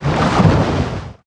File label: Shark Attack